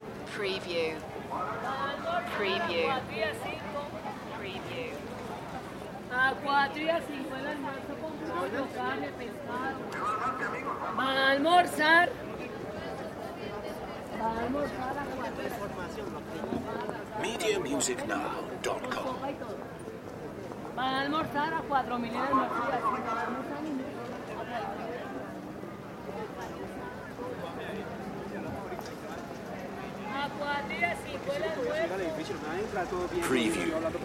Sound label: side street bogota